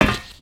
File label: metal plate, human step